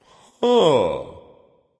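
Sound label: thinking, nonverbal; smite; voicepack; khepri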